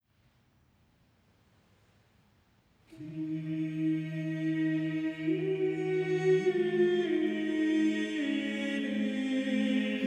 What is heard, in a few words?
kirye; josquin